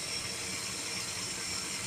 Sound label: water flowing; cc0 sfx loops